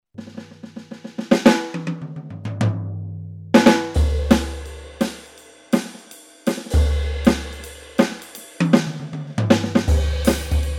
kit LegacyJazz; ATV a5D

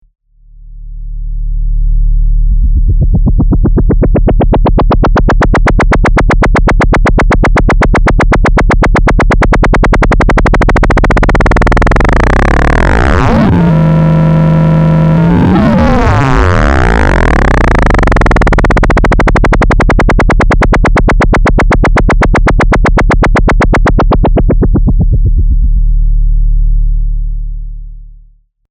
OSC FM

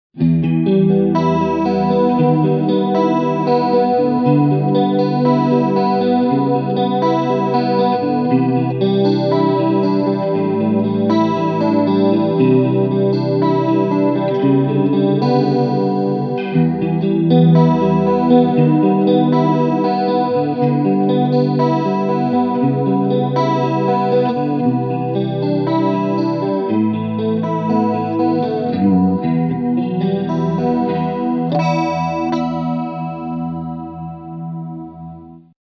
Chorus Full

